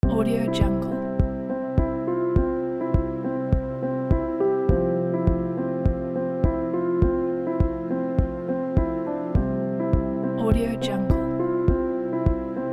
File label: ambient background